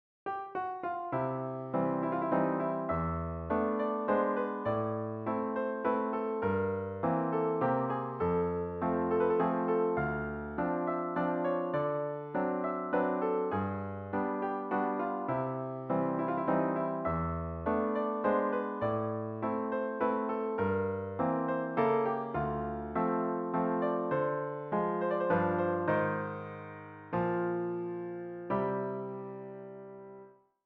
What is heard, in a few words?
waltz